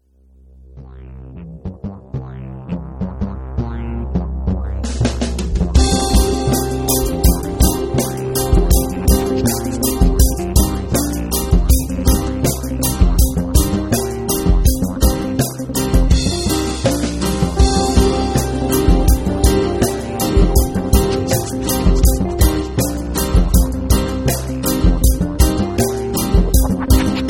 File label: scifi